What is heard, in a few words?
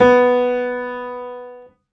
Piano, Harmonic